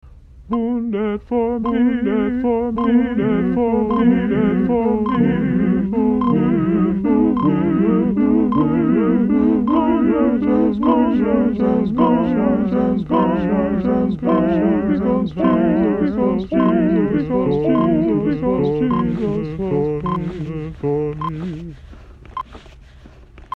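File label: minor Canon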